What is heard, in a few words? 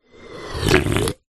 human sip soda from can